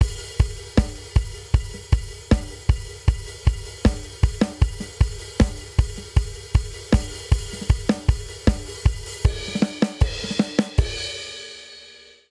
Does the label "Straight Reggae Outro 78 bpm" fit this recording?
Yes